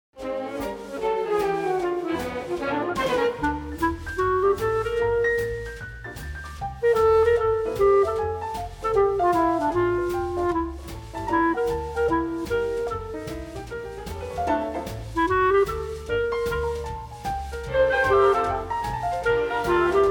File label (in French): clarinette